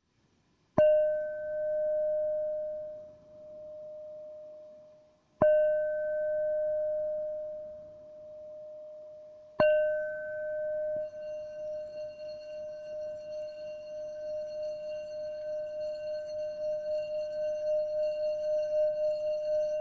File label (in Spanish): re, cuencos tibetanos